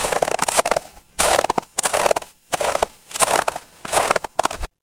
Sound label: footsteps snow